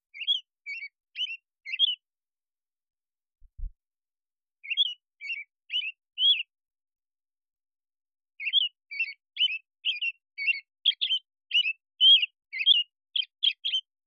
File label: Robin